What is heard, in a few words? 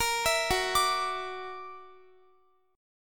Gb Augmented 7th, down, Guitar; Listen to Gb+7 arpeggiated